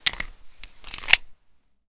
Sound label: USP Reload; zps; Weapons; Firearms